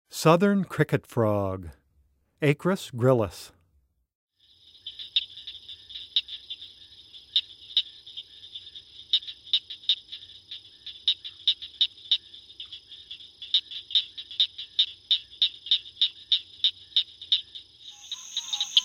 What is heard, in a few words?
Southern Cricket Frog Call